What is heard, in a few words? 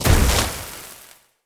Casting Device Block Parry; Weapons; Player